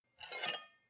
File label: cutlery take from plate slide, gastronomie; berufe; soundpool